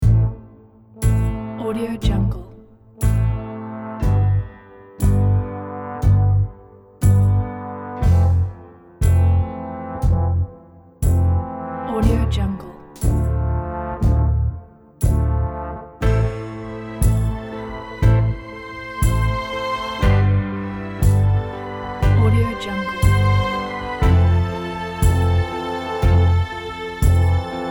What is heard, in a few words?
60s drama; hyperprod